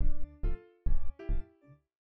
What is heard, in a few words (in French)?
truc orgue